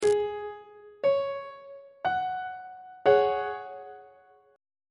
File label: d flat suspended 4th 2nd inversion on treble clef